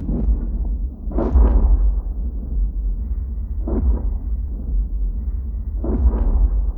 RAUMSCHI; Best Service; Advanced Mega Effects

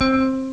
Blech; ST-XX Amiga Soundtracker Sample Packs

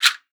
RASSEL; Xsample VOL-9

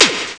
Zisch; ST-XX Amiga Soundtracker Sample Packs

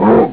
Bellen; ST-XX Amiga Soundtracker Sample Packs

